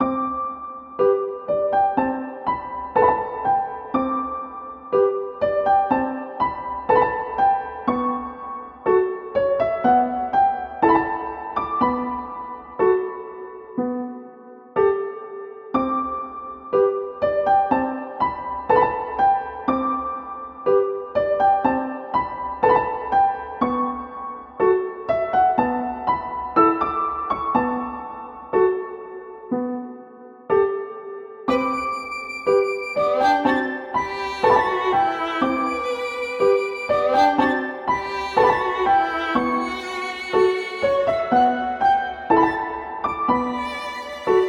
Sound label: snowy; Undertale